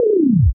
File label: gameover